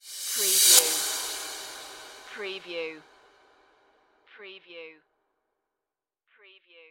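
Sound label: Production Element Cymbal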